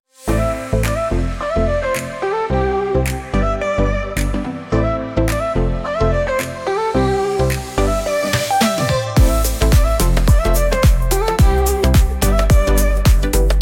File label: Pop